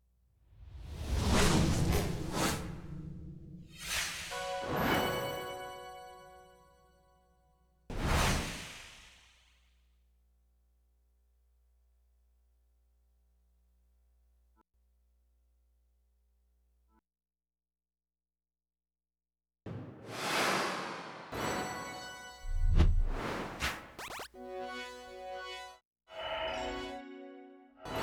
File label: SFX